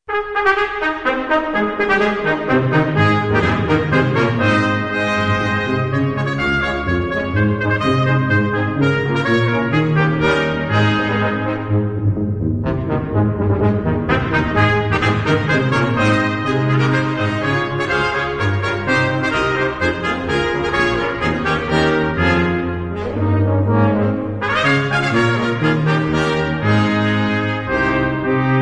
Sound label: European Carol Medley